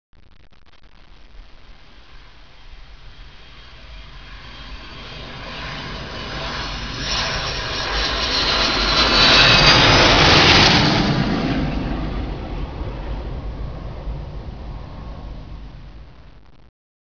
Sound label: jet